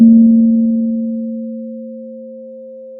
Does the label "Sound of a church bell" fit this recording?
Yes